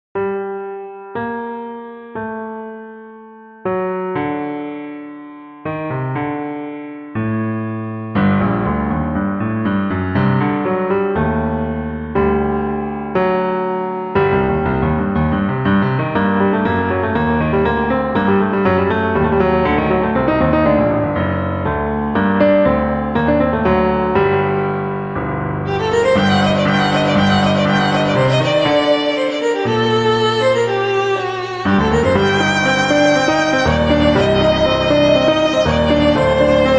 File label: Classical Piano and Violin